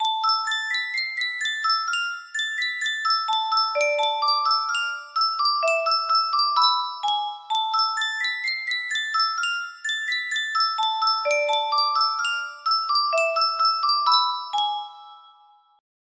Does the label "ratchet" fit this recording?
No